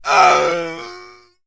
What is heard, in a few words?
man death; Mount&Blade Warband